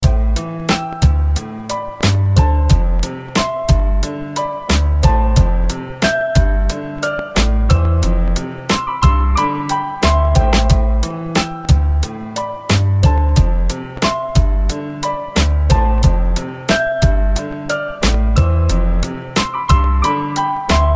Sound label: cool music i phone 3g s ringtone